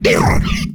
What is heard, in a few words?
attack; CosmicRage; combat; creatures; alien